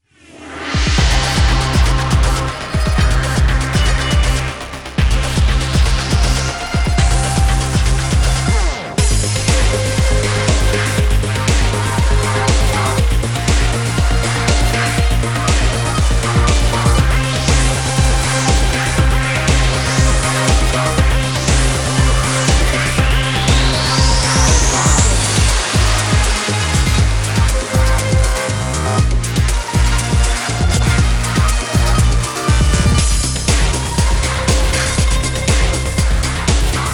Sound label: Bgm Rally Car select; Fist of the North Star Lost Paradise; Hokuto ga Gotoku; PlayStation 4 gamerip